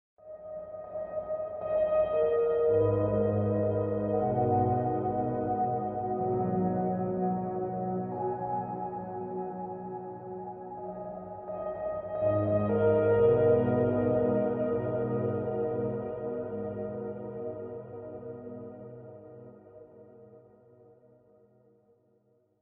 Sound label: Bathroom Vision Piano